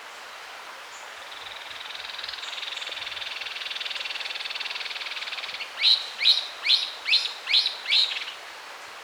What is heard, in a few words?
Cichlocolaptes leucophrus holti song spontaneous at dawn